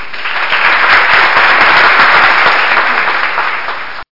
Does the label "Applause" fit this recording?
Yes